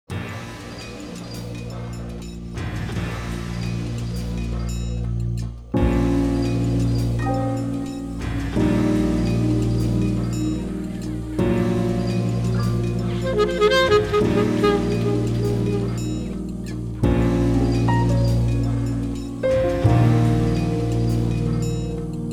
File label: April Blues